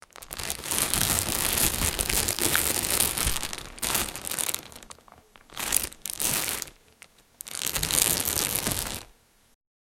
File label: put something in a bag